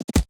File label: reel bounce main